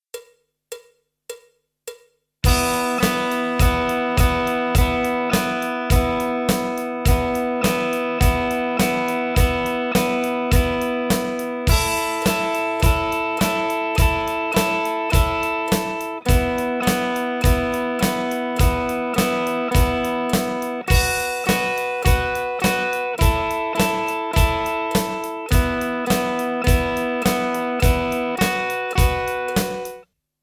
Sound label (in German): Blues-Rock Lick, E-Gitarren